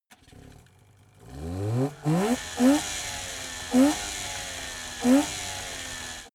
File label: car saab 92 startup blips engine